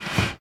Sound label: Puzzle Pieces Moving